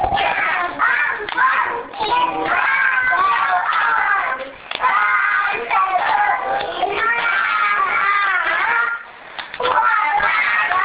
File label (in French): chanson, laos